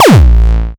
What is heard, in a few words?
Laser Kick